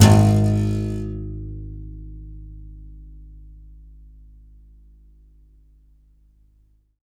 C. Bass Balalaika, Plucked Strings

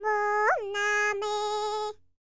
BULGARIAN, World Voices, BALKAN

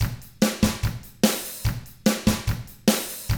rock drums

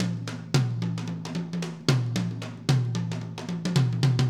Acoustic Live Drum; Platinum Audiolab

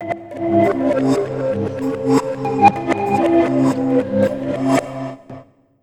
SREV PERC, REVERSE FX; USB Soundscan vol.60; Asian Traditions; AKAI

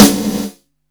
GATE, BODY BEAT; WORS STATION

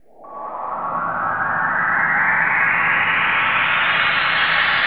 FLY HIGH, Trance Fusion, STOP SHORT; ILIO

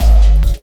808 Bass, Fx

